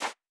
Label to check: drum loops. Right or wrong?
Wrong